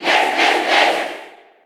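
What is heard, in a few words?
Cheer, Japanese; Ness; SSB4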